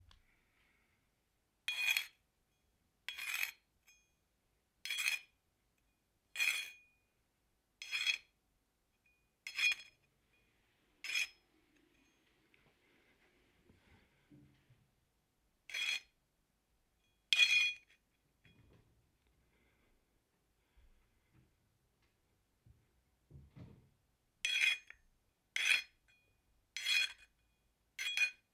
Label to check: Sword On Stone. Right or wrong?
Right